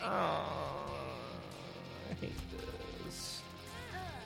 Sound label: whine